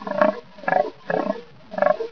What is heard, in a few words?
bullchicken idle